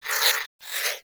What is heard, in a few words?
Vocal Snore, Critters; Characters; Warframe; Cryptilex; Hunting Critters